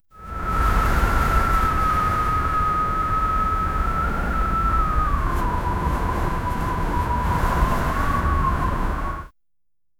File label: soft music wind blowing